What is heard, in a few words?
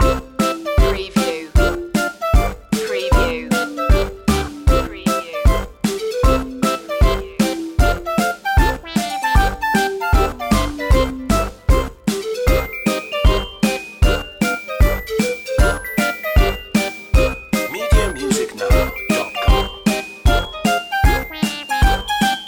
retro video game chicken run